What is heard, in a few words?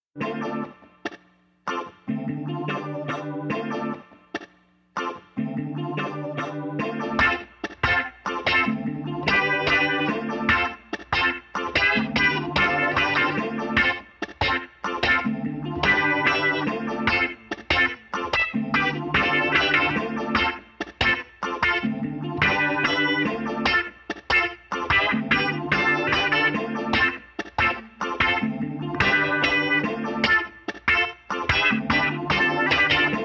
retrosonic phaser